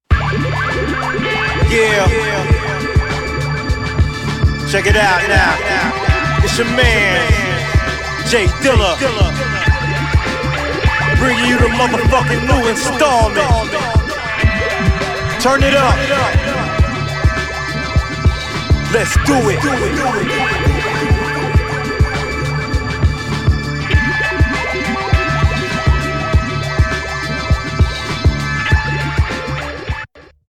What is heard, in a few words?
instrumental